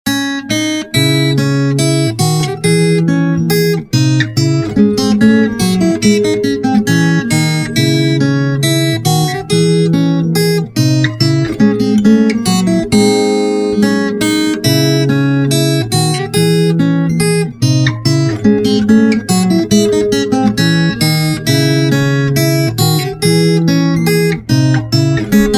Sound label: bourree d-moll; rober de wize